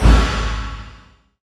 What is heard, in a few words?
XENON CRH, Industrial; USB Soundscan vol.24; AKAI